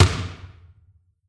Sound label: Sfx - Special Basketball Bounce